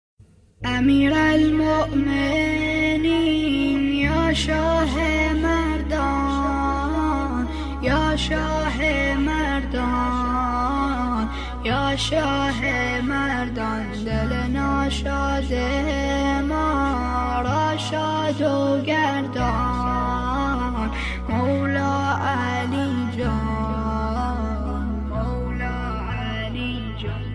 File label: Iranian Song